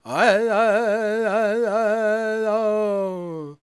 C# MIN, World Voices, LAKOTA

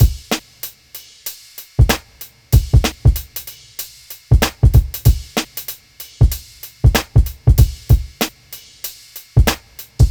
Drums, Hip Hop

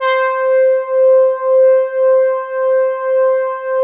MEMORYMOOG; Big Fish Audio - First Call Vintage Keyboards

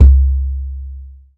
Djembe Super Gun; Planet Earth; Digital Sound Factory Planet Earth

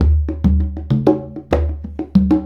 Congas; Essential Percussion

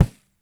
Frame Kick, World Percussion One Shots; Sony CS

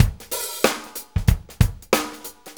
Shuffle Loop, Full Kit Loops; Sonic Foundry (Sony Creative Software); Loops & Samples Drum Tools

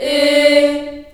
D3 CHS EH, CHILD EHS, Choir; USB Soundscan vol.28; Choir Acoustic & Synth; AKAI

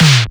Small E-Tom; Roland MV8800; SONIQ DRUMS

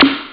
zapp; Amiga Soundtracker Sample Packs

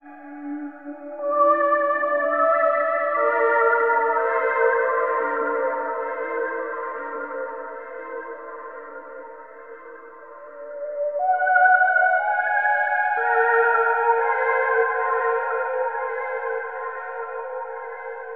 WHOLE TONES; Zero G; Malice In Wonderland

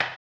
HAMBONE, Vocal Blues Soul; Spectrasonics; Planet

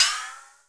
SDS CHINA, Drums, CYMBAL; Best Service; Real Mega Drums VOL-1

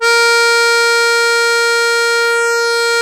MUSETTE A#2; Historical Instruments; AKAI; USB Soundscan vol.23

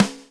GROOVE 92; AMG Gota Yashiki Groove Activator

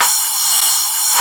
CRASH, DRUMS; Analogon

